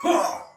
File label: Pain, Soldier Male; Sinecure